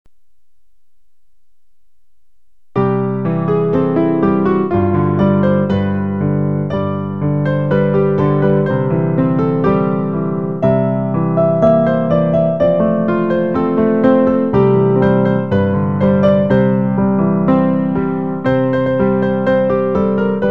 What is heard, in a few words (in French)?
piano; recueil Louez Dieu